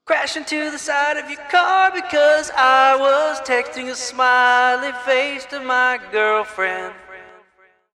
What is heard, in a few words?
texting; bubbles